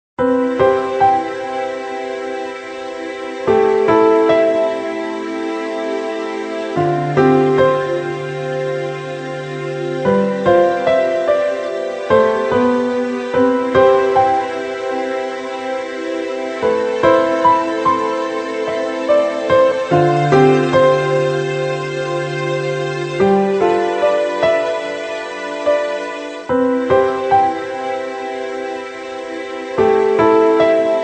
visual novel bgm silence; higurashi

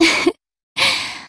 ehe; Rosa

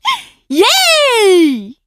"yay!"; Beatrice

